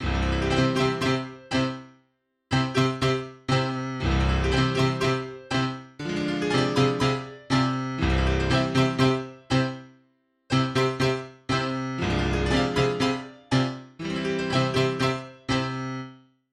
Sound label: melody in woodwinds